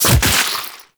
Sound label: Infested Splitter Gore Spew; Warframe; Grey Strain